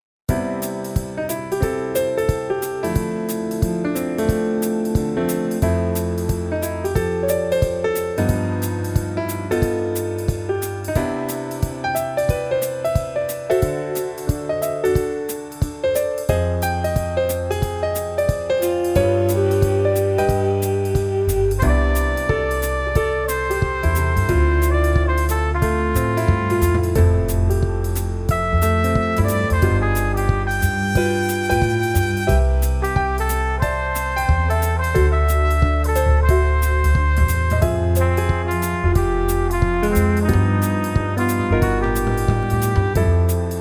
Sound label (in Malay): Music Latar Belakang